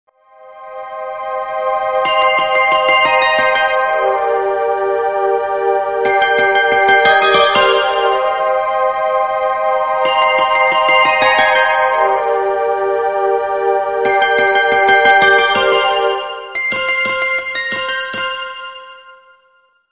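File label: light and pleasant futuristic sound; legkiy i priyatnyy futuristicheskiy zvuk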